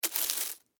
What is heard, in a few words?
Insect, Dig, Foliage, Leaves, Bug, Movement; Sound Effects:Animals:Insects